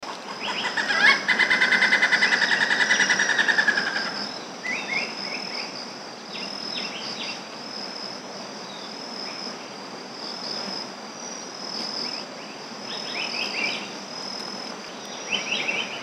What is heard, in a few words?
malabar gray horbil